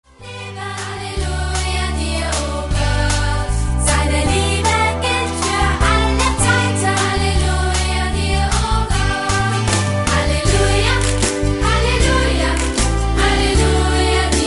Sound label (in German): halleluja ruf